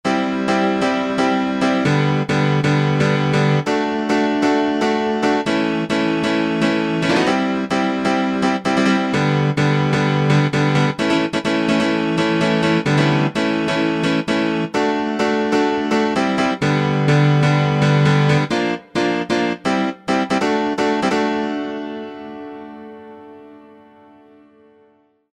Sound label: House Chord; Alesis Rap Techno Dance